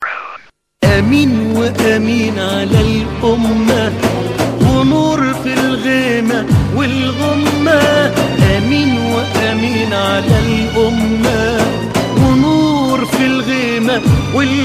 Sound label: islamics ringtone